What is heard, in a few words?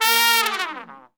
A#2, Section Horns